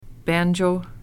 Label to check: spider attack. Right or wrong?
Wrong